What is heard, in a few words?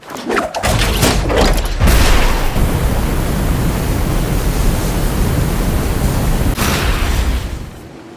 Glider; Rhino